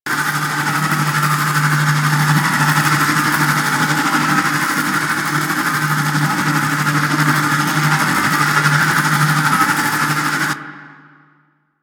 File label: Gas City Rotating Projected Hologram Loop; Ambience; Props; Warframe; Corpus Gas City; Props Remaster